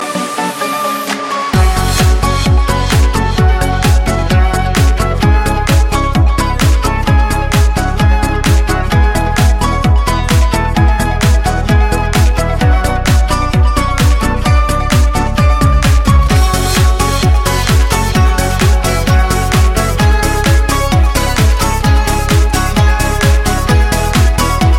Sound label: Cool Mysterious